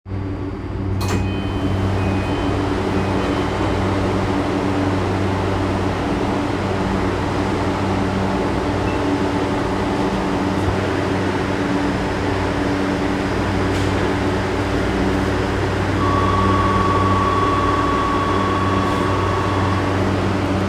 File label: train sounds; 東急田園都市線駒沢大学北